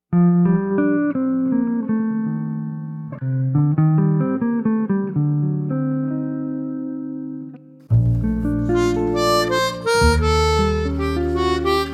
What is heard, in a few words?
Caribbean Jazz